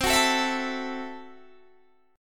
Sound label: C 6th Flat 5th, C, down, Guitar, DADGAD